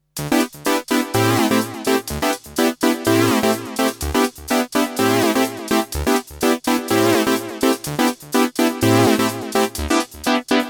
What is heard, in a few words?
brass Sequenz